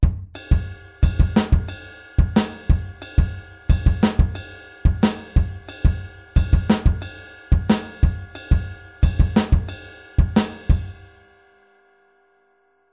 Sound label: Groove off